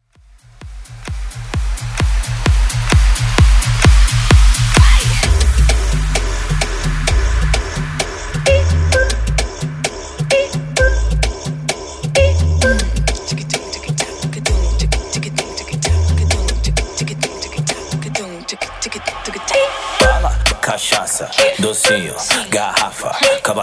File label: Blend